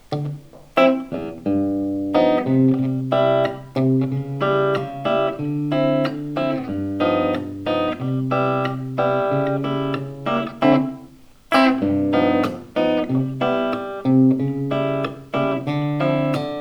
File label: 7 band eq